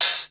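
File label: hi-hat tek open, Yamaha Motif ES drum machine; 300 Drum Machines; Yamaha Motif ES-Series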